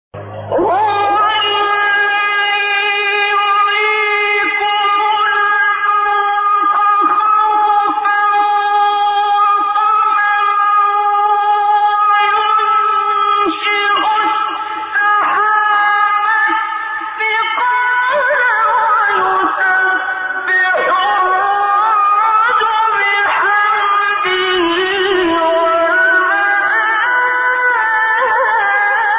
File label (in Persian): تلاوت دلنشین آیات زیبای سوره رعد; عبدالباسط